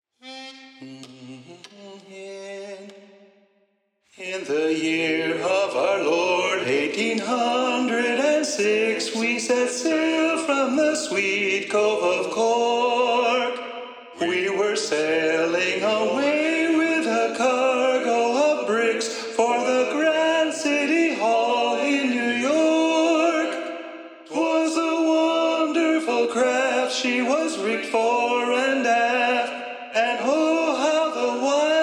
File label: The Irish Rover (Tenor Predominant); sea shanties